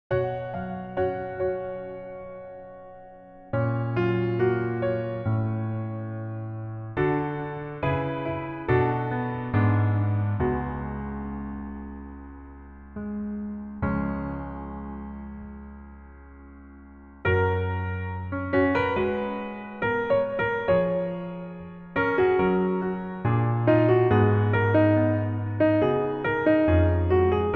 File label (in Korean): Piano 멜로디 MR -1키